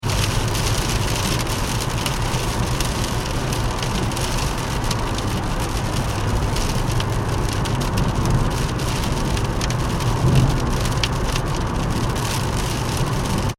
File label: transportation car driving 70mph internal rain ford focus; Car Driving 70 Mph In Rain - Internal Recording - Ford Focus; Cars; Vehicles; McKinney Sound